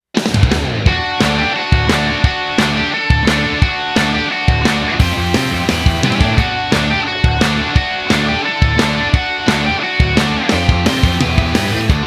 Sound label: Instrumental